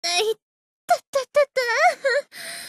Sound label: death; csgo